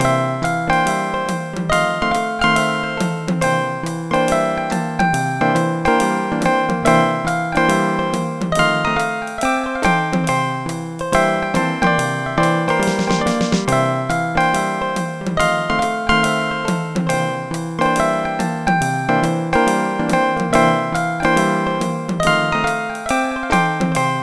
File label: solo; Dr. T's MIDI Recording Studio